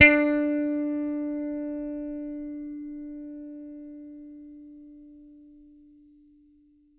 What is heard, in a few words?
PLUCK D4, Bass; Good 80 Pluck; Bass Mace; DirectWave